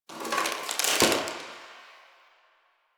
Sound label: Box Of Trash Rustle; Warframe; Ambience; Nineteen Ninety Nine